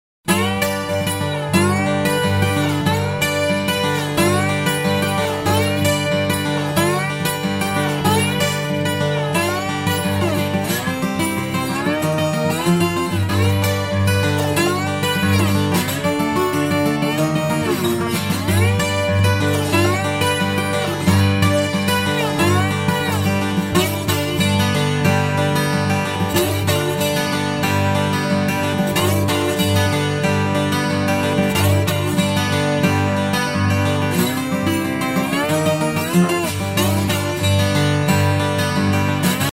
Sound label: slide